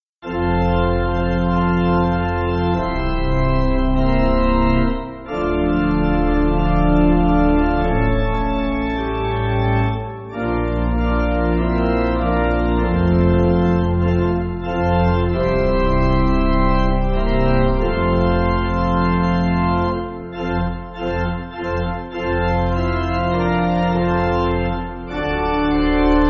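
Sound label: Pipe Organ, church backing; Ira D. Sankey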